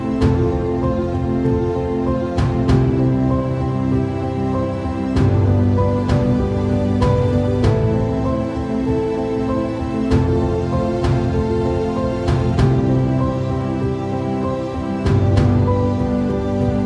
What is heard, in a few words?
Vivo official ringtone